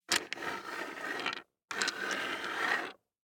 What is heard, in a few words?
DICE on Hard Wood, Slide, Standard, 5 Five Dice; Samples; ArticulatedSounds; DICE Sfx Party (Extended)